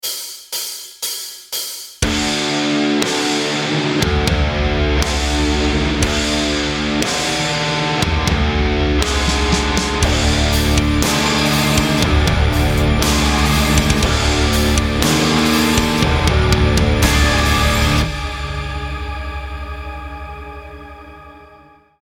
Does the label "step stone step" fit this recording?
No